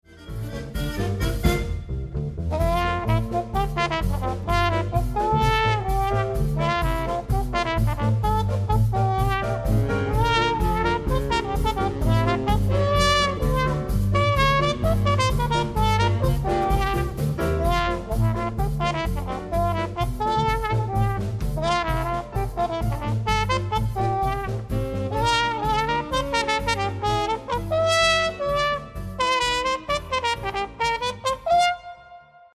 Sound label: plunger